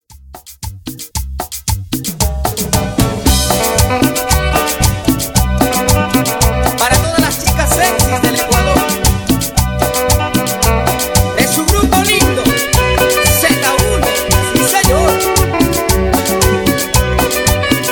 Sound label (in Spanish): Intro Chicha Percu, Bpm 114